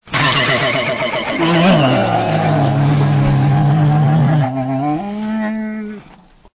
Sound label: bear angry